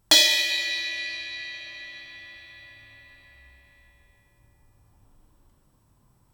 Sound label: Crash Bell, drums singles; The Roots Drumloops